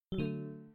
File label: disconnect